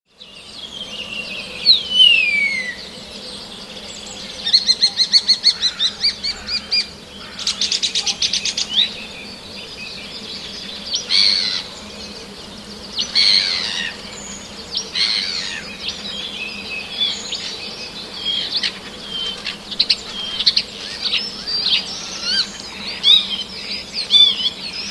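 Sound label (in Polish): szpaki, Głosy szpaków - nagrania własne; Inny przykład szczebiotu szpaków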